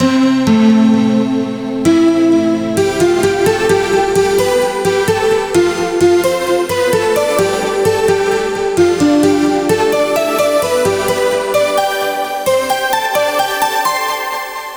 Main Second Lead

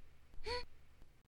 Moan

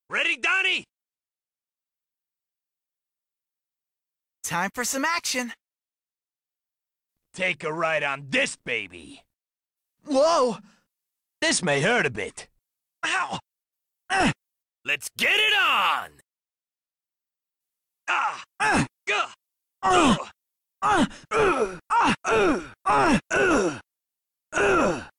without bed squeak; Plastron

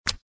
Slide; Lewd SFX

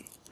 Sex Slide; Lewd SFX

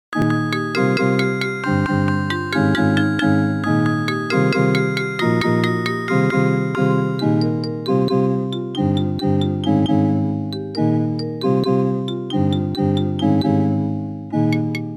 Instrumental Cover

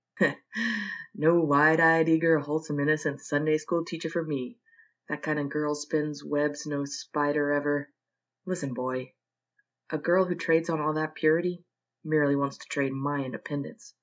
Cover, karaoke, gender bender, showtunes

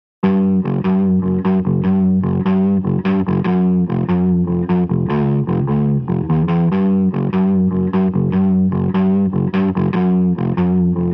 Extreme Metal Cover

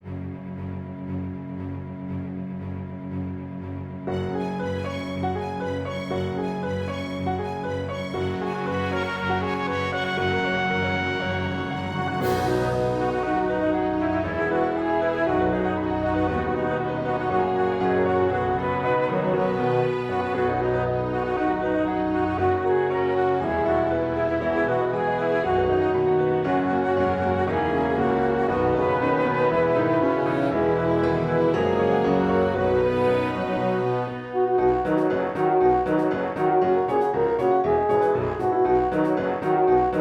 Orchestral Rock Remix

